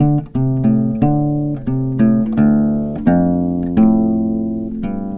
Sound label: guitar, Sad